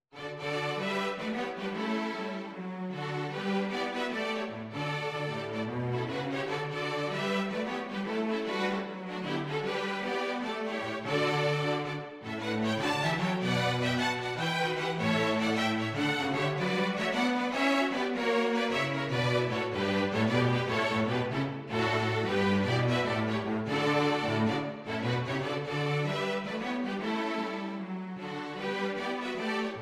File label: string jazz; Ding Dong merrily on high